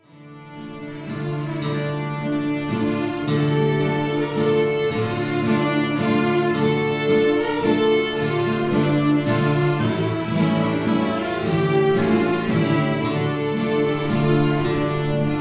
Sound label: Waltz; Emmas